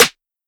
snare perfect, Hip-Hop Sample Pack, Drum One Shots; Drums; Slate; CAICOS Hip-Hop Sample Pack